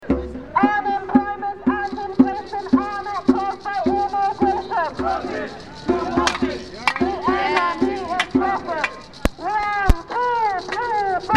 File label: chants